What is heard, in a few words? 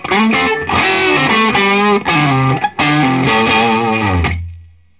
Vintage Blues Guitar, SOLO; USB Soundscan vol.22; AKAI; SOLO A125